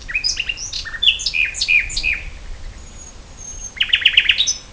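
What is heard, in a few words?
call, Nightingale, song bout; Mean interval between phrases = 2.60 s; luscinia megarhynchos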